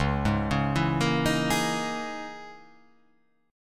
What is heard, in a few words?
Db Minor 6th Add 9th Db down; Dbm6add9 arpeggiated; JGuitar